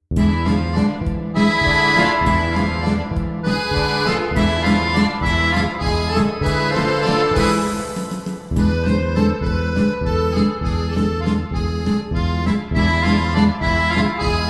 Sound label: Balkan